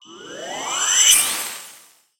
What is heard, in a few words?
pick me extra scatter reveal; vegas night life